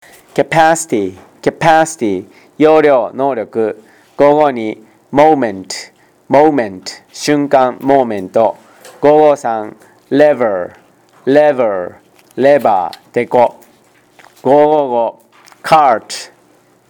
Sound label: tango